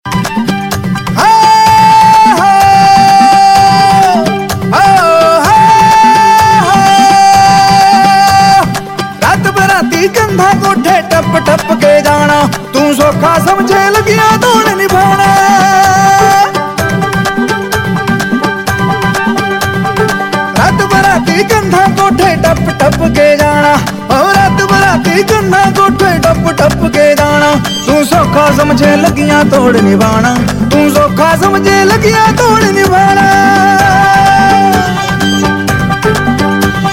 Punjabi Ringtone

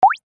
Wet Ringtone

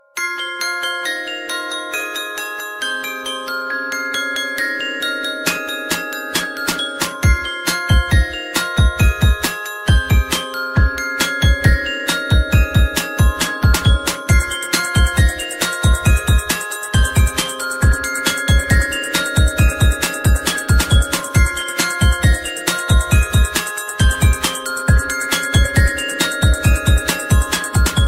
Ringtone For Mobile

